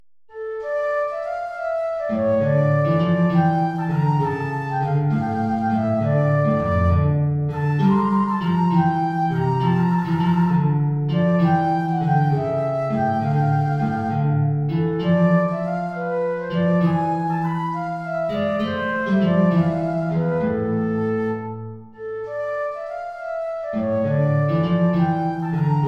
Siciliana (Querflöte & Gitarre)

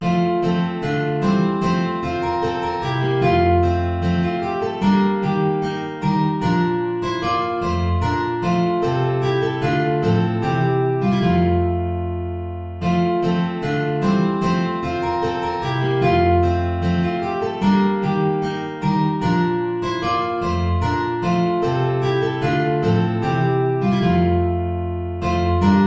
Ballet II (4 Gitarren)

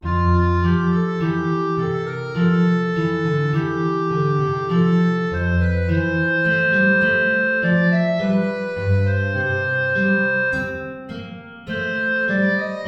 Klarinette in Bb & Gitarre; Warum sollt ich mich denn grämen

